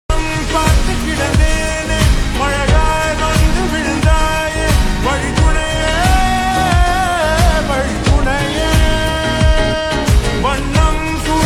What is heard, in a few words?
Song Ringtone Slowed Reverb; dragon